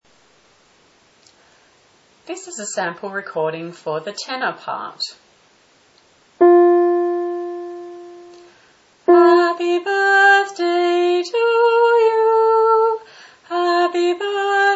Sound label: Sample Tenor